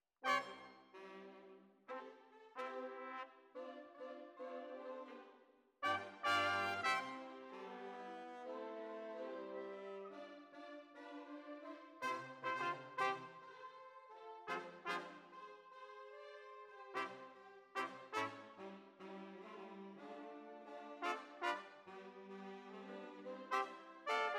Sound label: Trumpet